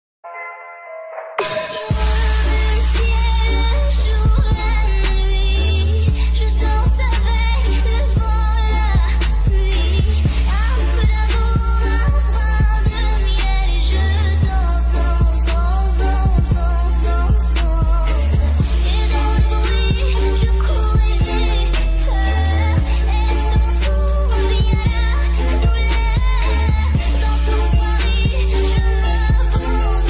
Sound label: ringtone last dance music